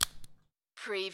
Lego, pull apart two lego pieces

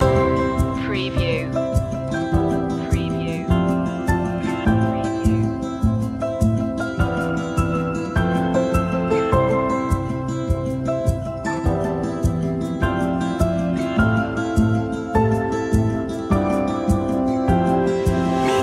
shining through loop

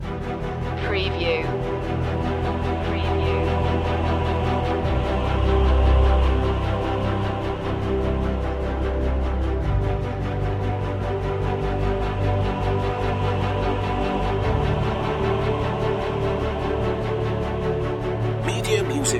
epic hymn loop

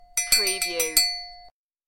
Cowbell

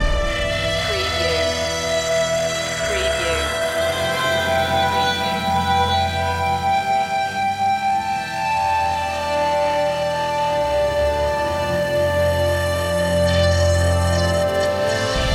impending doom loop

